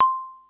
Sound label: mallets